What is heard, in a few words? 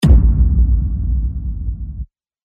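cinema transition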